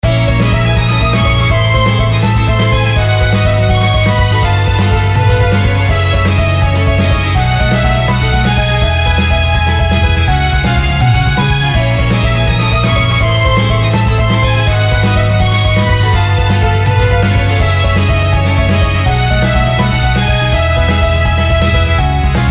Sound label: acoustic; maoudamashii